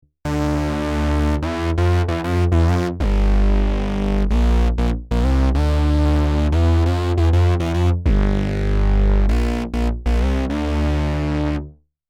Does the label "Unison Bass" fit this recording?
Yes